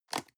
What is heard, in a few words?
plastic box food small open hard